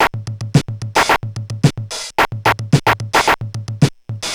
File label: Korg MR-16 (110BPM), beats